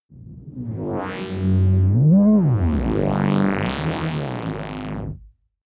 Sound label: fluctuating synth; comedy-cartoon; elektronisch